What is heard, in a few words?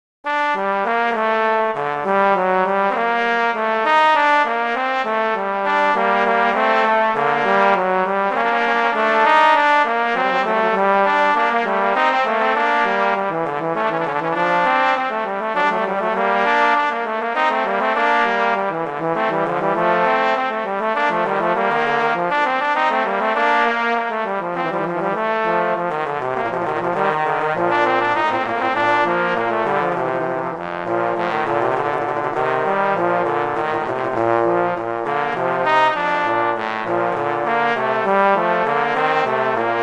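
Vivace moderato, violin viola; Telemann; Canonic; Sonata 4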